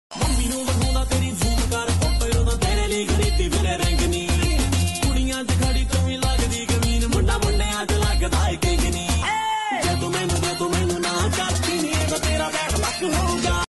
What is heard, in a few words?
Bad luck song tik tok acting